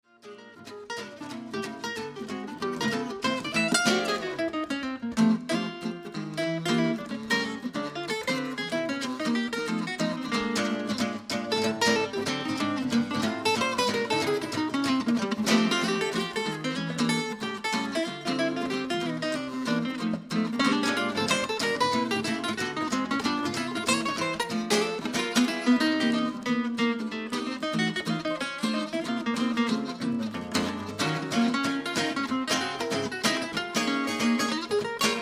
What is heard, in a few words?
Guitar, Gibson L-4